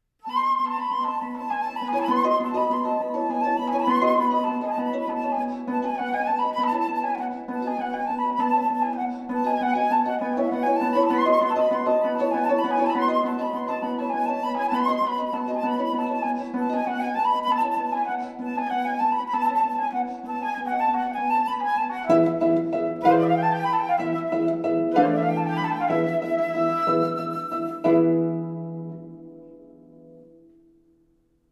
Flute Harp

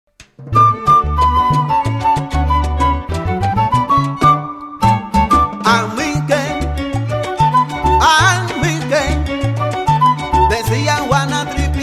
Traditional Cuban

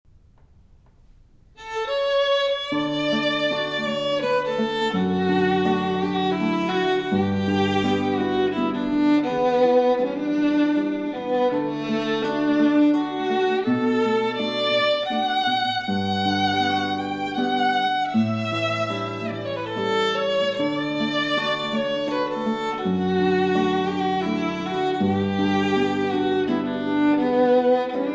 Guitar Violin

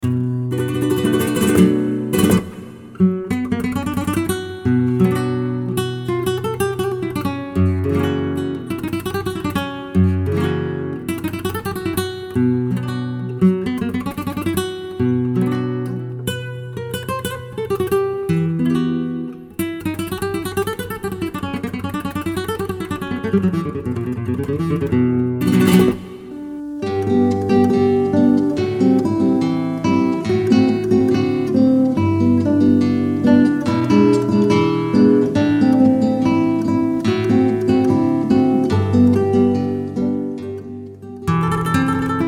Spanish Guitar Mix

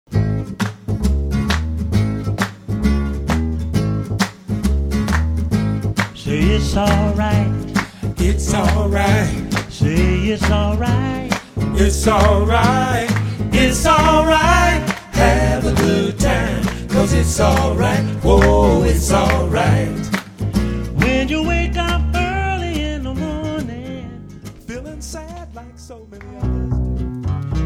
Motown Urban Vocalists

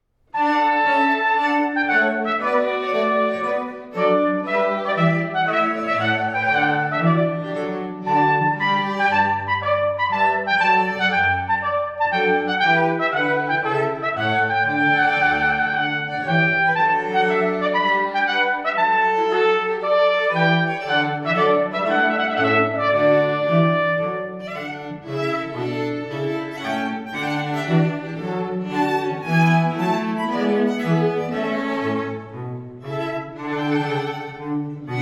Trumpet Quartet

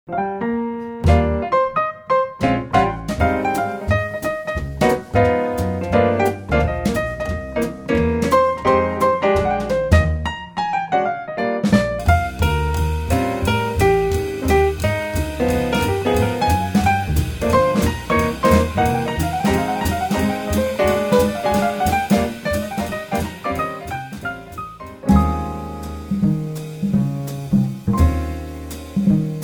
Jazz Trio; Chicago

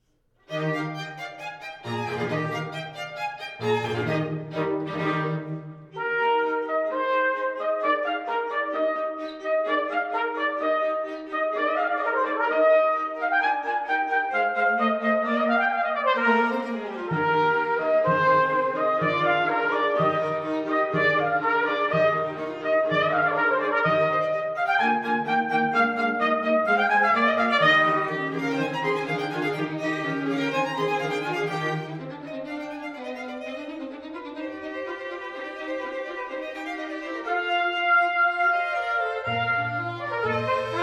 String Trio Trumpet

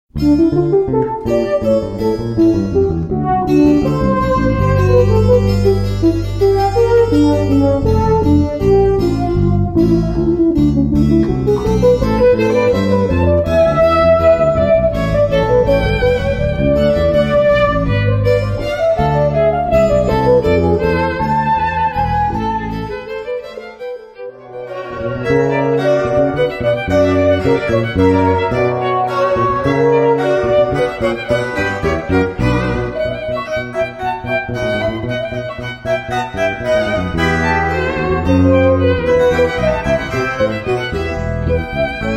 Chamber; Elektra

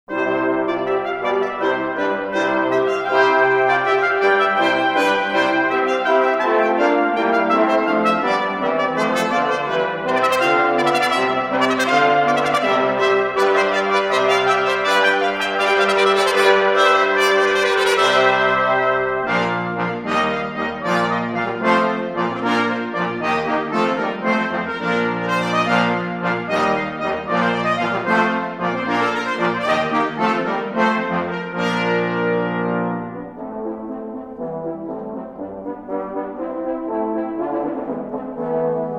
Brass Quintet

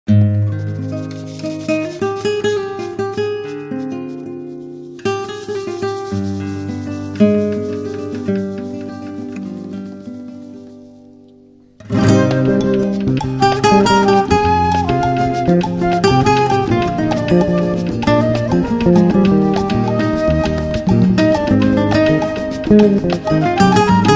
Latin Gypsy Group

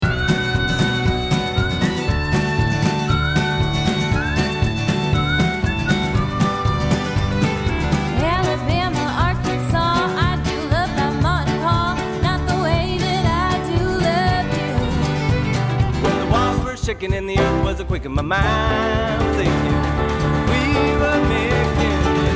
bluegrass band performing pop songs

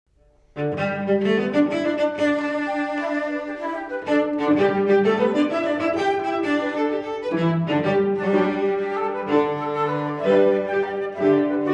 Flute Cello Violin

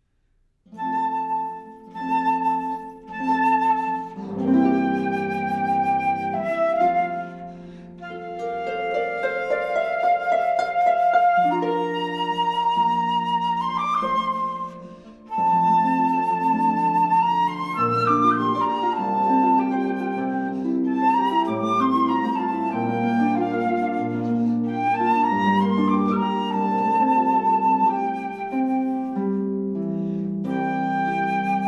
Flute Harp

